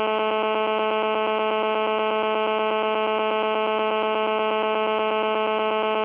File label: GSM buzz